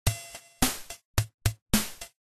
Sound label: Msx music drums (plus noise)